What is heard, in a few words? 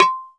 BARIMBA C4; Q Up Arts; Dream Experience; DRUMS PERC